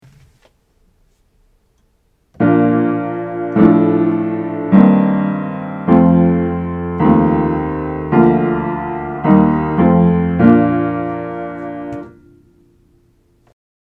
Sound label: Triads in Inversion; Harmonizing; Aural Training exercise